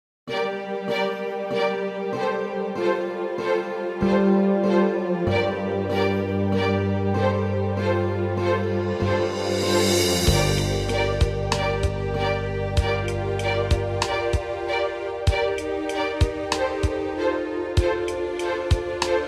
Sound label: vokaliz minus brass; klassik